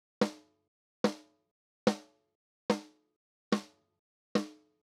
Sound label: Snare